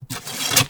arrow grab from quiver, Medieval Weapons Sound Effects